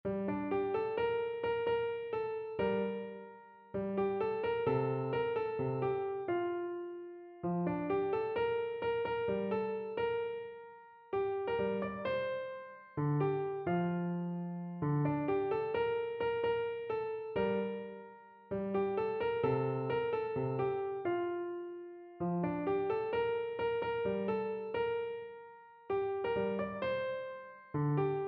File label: Santoor